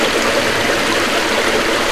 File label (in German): wasser; Amiga-CD - Volume 2; dolbytest